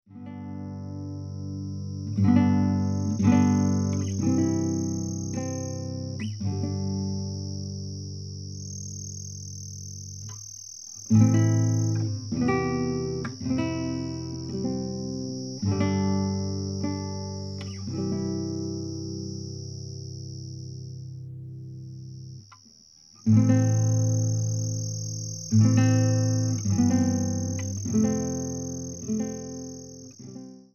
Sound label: soldiers and crickets